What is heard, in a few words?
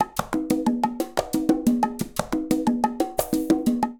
Colombia, Latin Percussion; Ableton